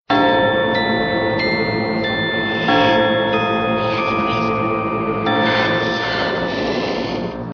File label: horror ringtone